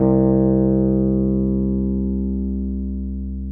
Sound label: Wurlitz EP C1; Sonic Reality - Vintage Timetraveler